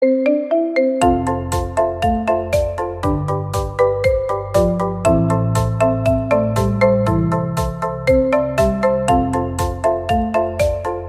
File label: Marimba